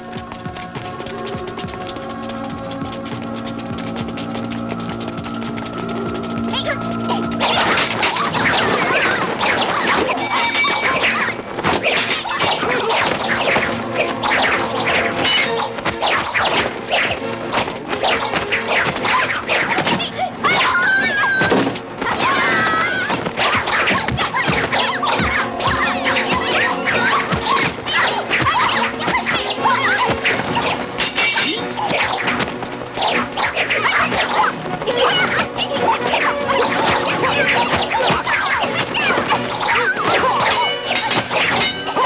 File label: Fight; 特酷声音素材库